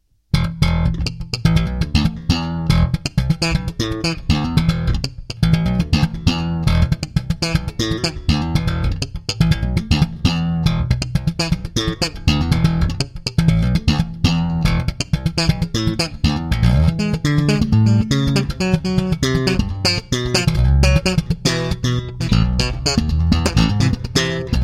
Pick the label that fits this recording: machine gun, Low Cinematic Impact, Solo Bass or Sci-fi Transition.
Solo Bass